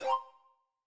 confirm, tones; inteface; inteface sfx pack 1

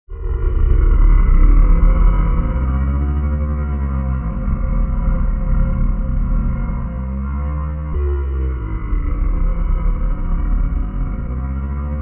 Eerie Sound, horror; Longer; Horror Sound Pack

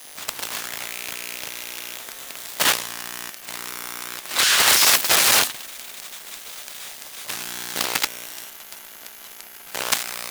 Electromagnetic Smartphone Sequence; Mono; Essentials Series NOX SOUND

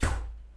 shoot, weapons

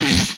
Impact, retro arcade; MMRetroArcadeSoundsPack

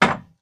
hit

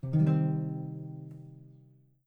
string minor; abstract; Free Sound Assets

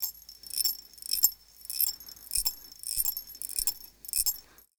Ceramic Glass Shaker Dry; Sample Growing Stream 002; Ear Candy

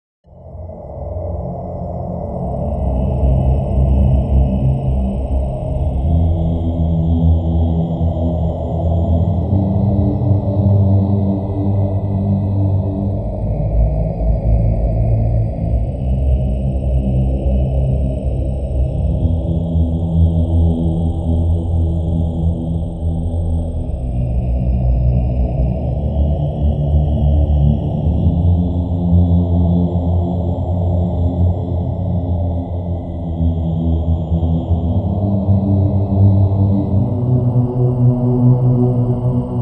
Dark Ambient Music, horror; Loopable; Horror Sound Pack

